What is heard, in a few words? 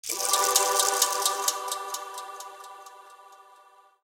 clock